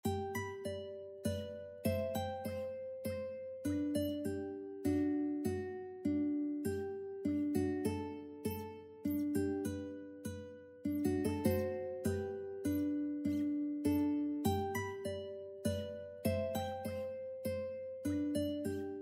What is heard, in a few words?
ukebook minuet; mozart